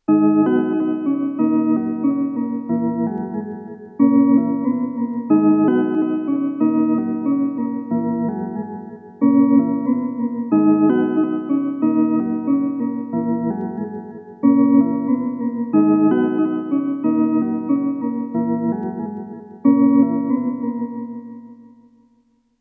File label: Qun Mk2 FM Chords Melody; Nunomo